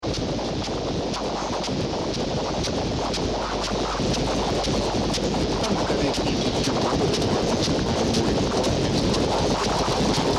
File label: 1600kHz; WTZQ